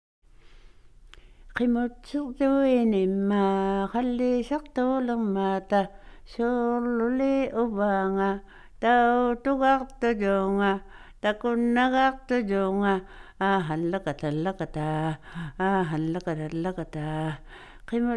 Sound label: Good Eyesight Song, INUIT